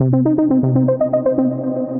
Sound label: A Stranger Saw, Synth Rhythmic